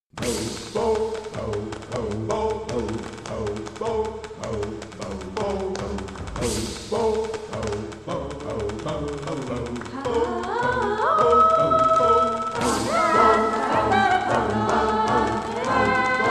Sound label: A Cappella; Super Smash Bros